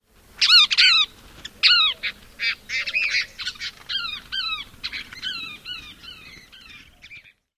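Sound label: mobbing calls, Cassin's Kingbird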